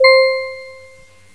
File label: Music Box; GMTones; Chromatic Percussion